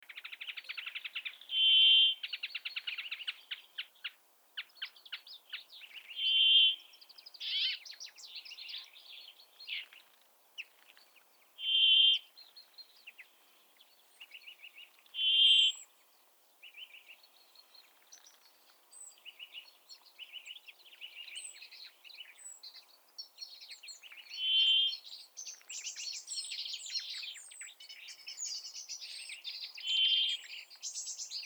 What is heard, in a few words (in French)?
pinson du nord